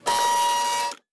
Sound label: servo small, sci-fi sounds, mechanical